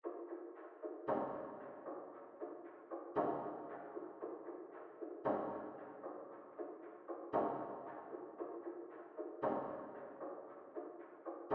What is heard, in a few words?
115 BPM A Min Percussion; Loop Stems; Cymatics; Infinity Melody Collection; Palm Trees